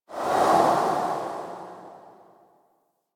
Museum Item Look Whoosh; Warframe; Vitruvian Museum

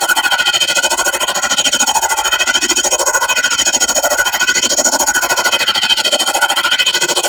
Glaive Loop; Enemies; Weapons; Warframe; Teshin; DE

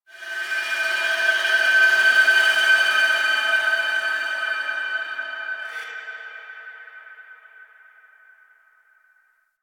Ambient Texture; Ambience; Warframe; The War Within; Golden Maw

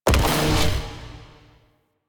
Radioactive Asteroid Mine Expose; Warframe; Railjack

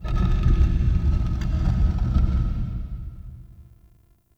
Distant Stone Texture, Crypt Ambience; One Offs; Champion Crypt

